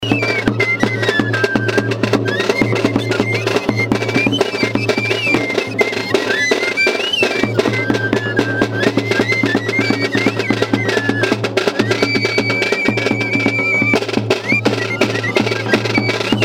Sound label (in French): Pipos et tambours durant Tolonomby